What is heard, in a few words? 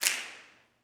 SNAPS, Gospel